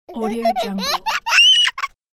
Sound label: funny baby laughing; human sounds; خنده ی بچه